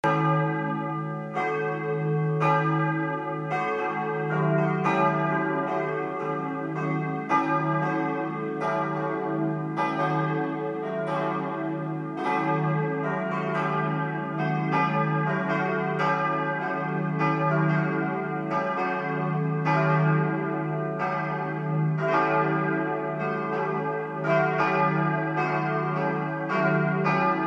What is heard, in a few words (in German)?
glocken lutherkirche speldorf